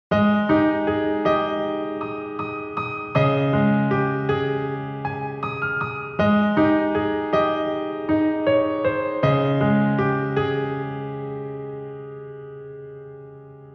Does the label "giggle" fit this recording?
No